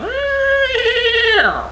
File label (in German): pferd; Little Red Riding Hood